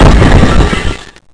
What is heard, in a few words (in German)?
aua; kampf